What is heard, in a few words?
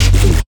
Key bass; Luxembourg Zwee